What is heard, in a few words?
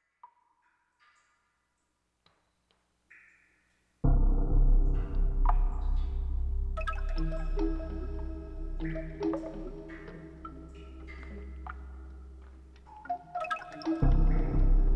Phosphorus World ambience; Lode Runner The Legend Returns